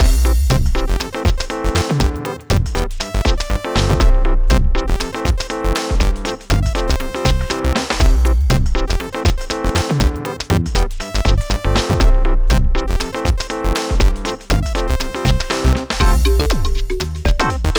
character and kart select

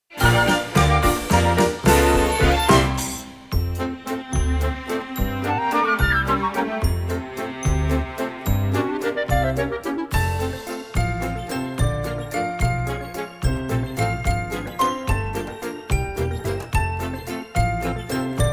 Underwater BGM Arrangement; SMW

